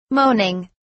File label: moaning